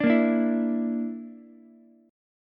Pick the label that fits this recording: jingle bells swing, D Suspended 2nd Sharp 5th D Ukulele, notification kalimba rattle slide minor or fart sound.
D Suspended 2nd Sharp 5th D Ukulele